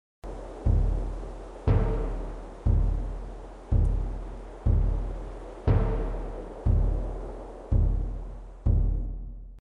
menu theme; temple run 2

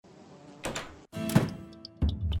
interaction; The Cat Lady

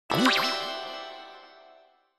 powerup; Owlboy; Otus

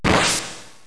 hit; final fantasy vii

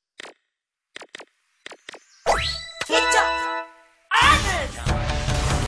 start; arms

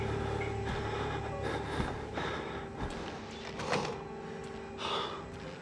player character panting

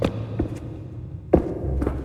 footsteps wood; We Were Here Forever

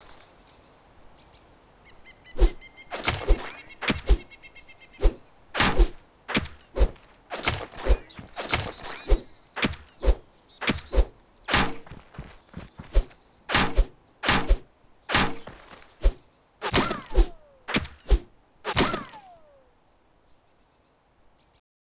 action hitting tree; dayz standalone

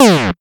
unpause; duck game

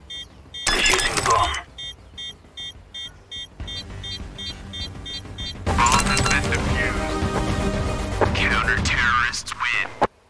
bomb defuse; counter strike 2

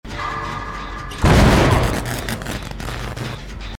skill fail; Dead by Daylight

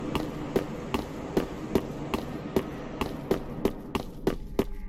footsteps stone; Dark Souls III

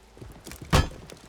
grab; for honor

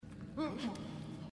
zombie; inside

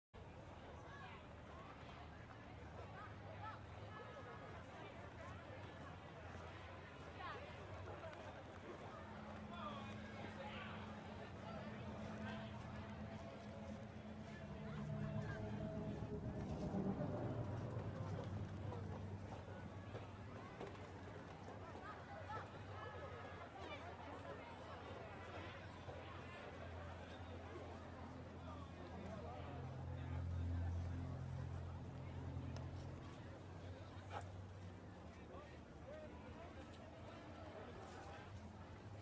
audio sample walking through heywood; Cyberpunk 2077

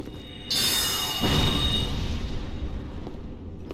magic; Dark Souls III

